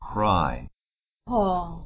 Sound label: cry